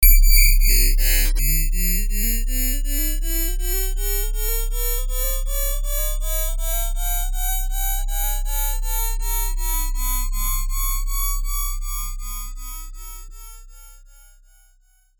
sound design frequency modulation robot voices ascend